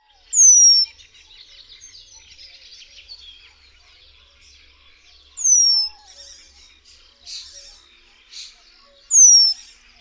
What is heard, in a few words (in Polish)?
REMIZ, birdsounds